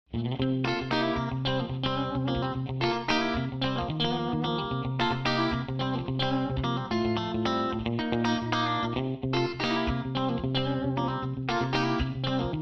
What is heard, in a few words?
Country Tune